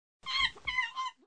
mono

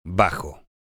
bajo

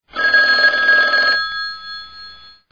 telefono

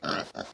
cerdo

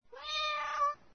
gato

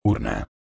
urna, esp

